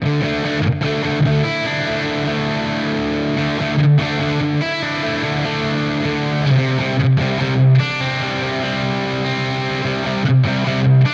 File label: Neural DSP Quad Cortex Neural Capture Bogner